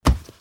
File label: punch